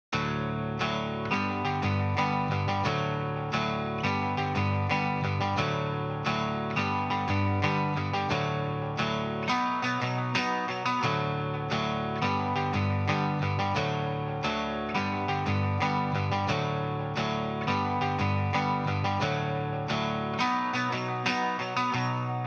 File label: pop guit topping